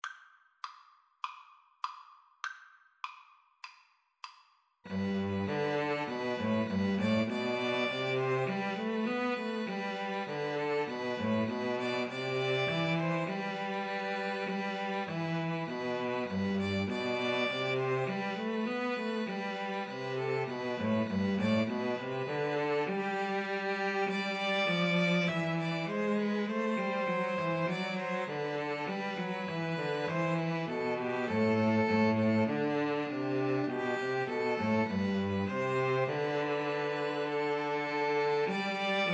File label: angels, String trio